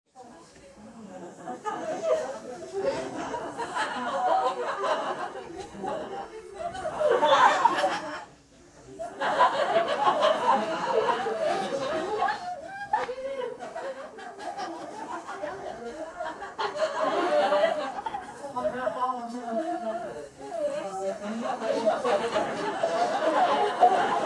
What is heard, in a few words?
sound of a family gatheri, human interaction